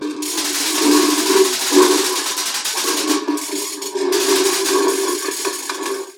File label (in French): Bruitage Enfer